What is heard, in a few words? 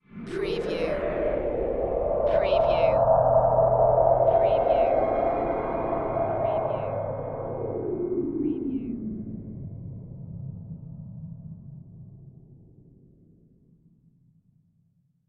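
Production Element Sweeper; Sound Effects Bible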